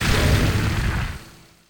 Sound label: Laser Hit Craft